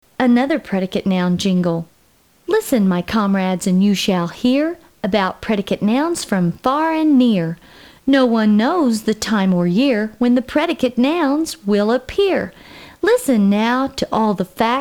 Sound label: predicate noun jingle